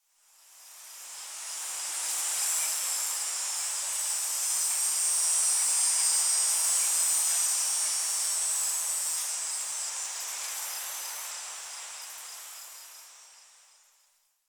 Tree Cicada; Warframe; Ambience; Nineteen Ninety Nine

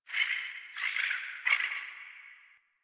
Landscape Mid Creatures, Ambience; One Offs; Warframe; Venus Open World

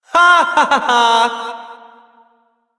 Evil Twin Doppelganger Laughter; Warframe; Entrati

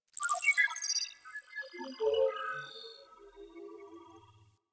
Beep Short; Ambience; Interior; Warframe; Orokin

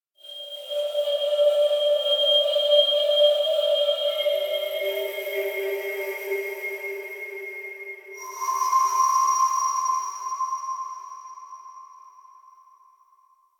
Texture One, Ambience; Warframe; RailJack

